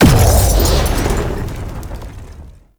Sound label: Flight Start Impact; Warframe; Uriel; Infernalis